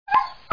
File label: lose; mutt; ToBeSIgma